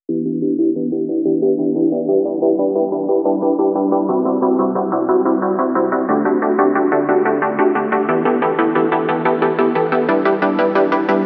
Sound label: build chord pluck; lead arp chord melody